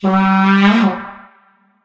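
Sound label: vuvuzela; source engine; tf; football manager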